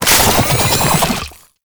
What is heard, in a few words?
Archgun Reload; Warframe; Weapons; Archwing; Tn Nokko Archgun